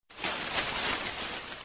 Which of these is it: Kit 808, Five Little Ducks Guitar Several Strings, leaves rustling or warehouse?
leaves rustling